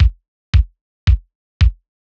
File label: Linndrum kicks; 112BPM